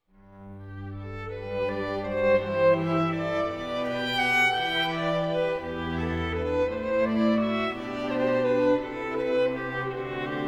String Ensemble; Jesu Joy of Mans Desiring

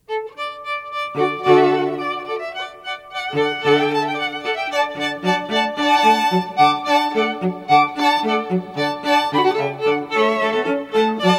Classical Music From The Style Strings; Jazz Classical Rockband

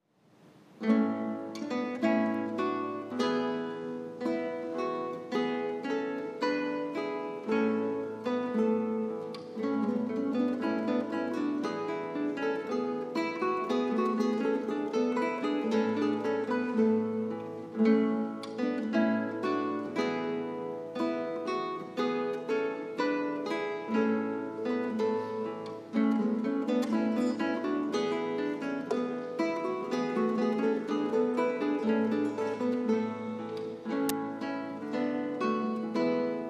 Lesson for two lutes; Classical

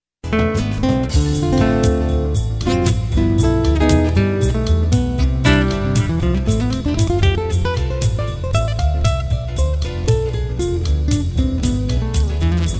jazz, Classical Guitar

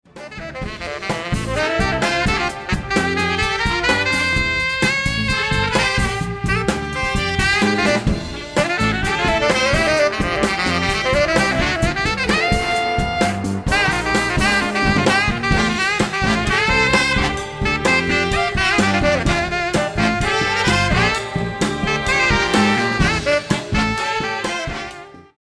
Big Band Funk

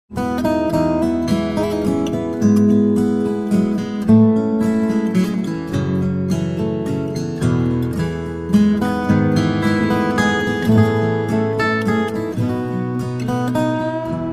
acoustic duo

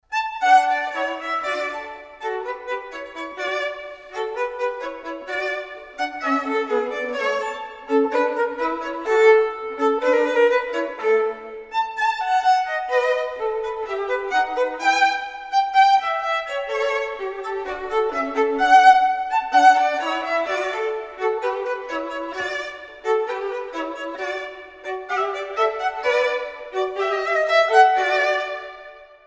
2 violin duo; string quartet; Mozart Duo 2

